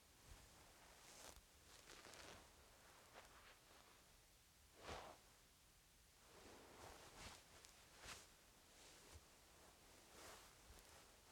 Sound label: Bed Noises